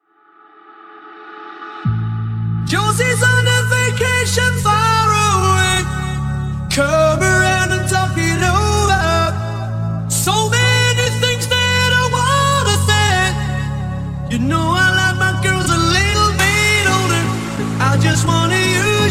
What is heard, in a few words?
Intro Acap Clean 128bpm